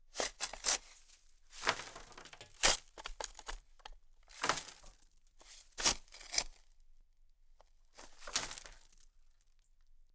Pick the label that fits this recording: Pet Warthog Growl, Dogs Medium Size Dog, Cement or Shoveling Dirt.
Shoveling Dirt